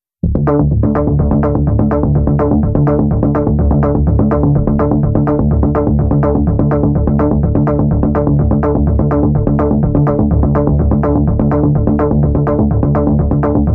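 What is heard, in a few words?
No Big Percussion No String Lead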